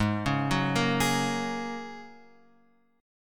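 A flat 7th Suspended 2nd Suspended 4th A flat Guitar Open F, chord; Ab7sus2sus4; x,3,1,1,1,3; arpeggiated; chordshape; JGuitar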